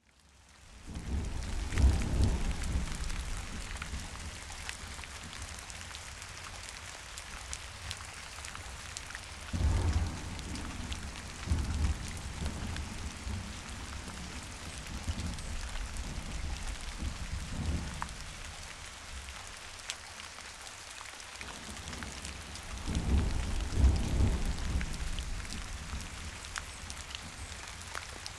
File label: thunderstorm